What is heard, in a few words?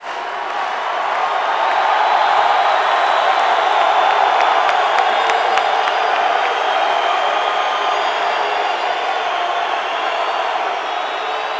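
Basketball Crowd Cheer; Crowds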